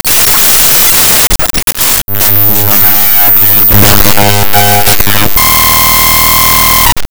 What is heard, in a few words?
Lengthy Glitch; Data Disruption; Glitchedtones